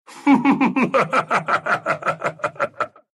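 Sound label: laugh, Russian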